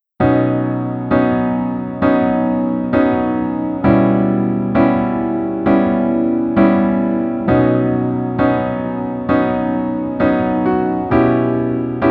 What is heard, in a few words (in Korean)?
Piano ver, -1키